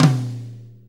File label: 4-4 Tom Flam, Drum Loops; Drum Tools; Flams